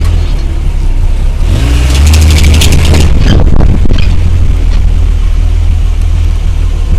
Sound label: pinging; falcon